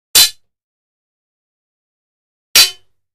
Broad Sword Hit, swords

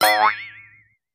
Ping A Boing, boings

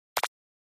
Button Button; buttons

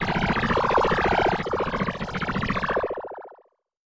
Multiple ray gun blasts. Zap, Ray Gun

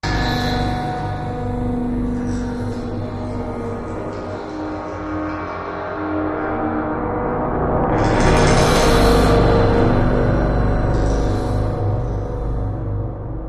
Cymbal Low Metal, eerie ambience; Doubt

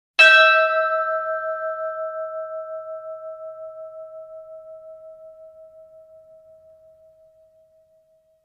Church Bell Single, bell towers

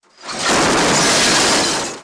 Auto Crash Huge Dest

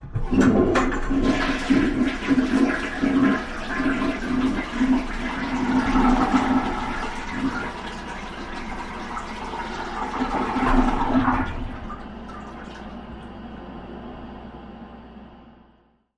Home Bathroom Reverb, toilet

